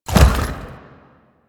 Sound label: Horse Jump Heavy; Foley Actions; Warframe; Vehicles; Duviri